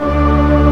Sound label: Organ, Barton+MortonMel